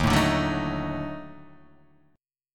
D sharp Minor Major 7th Flat 5th D sharp Guitar Open D